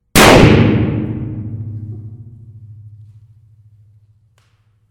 reverb stairwell; thatcher

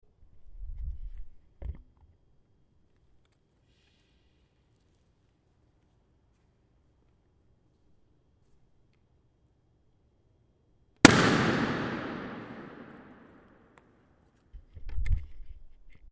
Frary steps

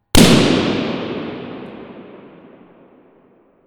reverb Big Bridges Lobby; Pomona

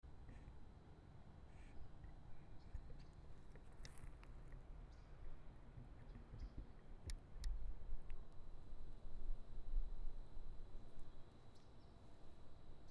Music Building Sculpture Outside; Pomona; Thatcher

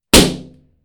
Reverb Elevator; Pitzer; Mead

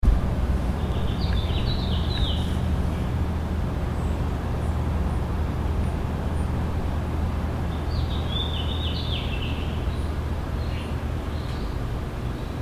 Beside Pool; Pitzer

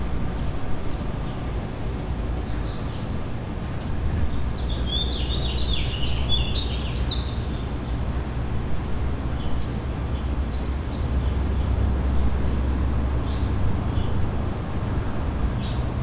Outside, Friday morning; Pitzer

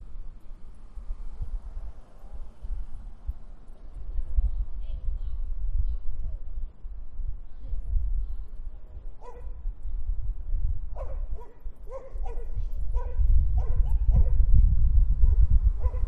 Doggie Park

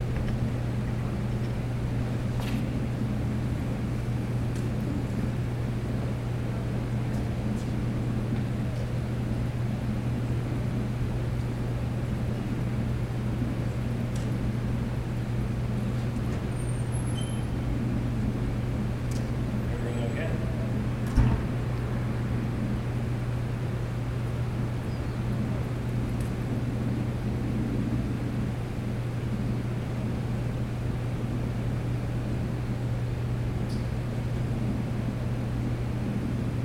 Pool Saturday 800am; Haldeman

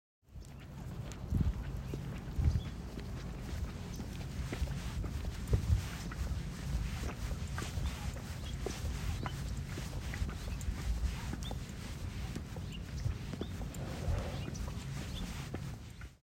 nature

